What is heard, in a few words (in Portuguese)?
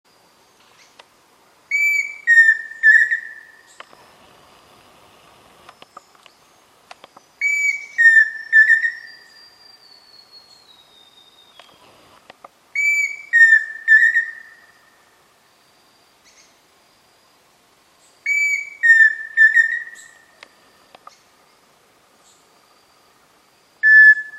canto do saci faisao